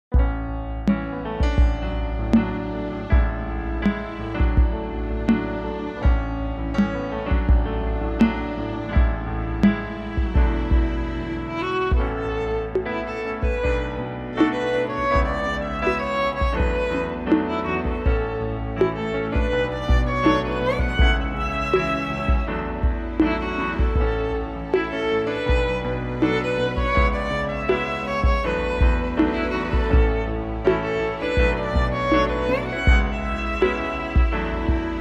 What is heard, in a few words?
cover violin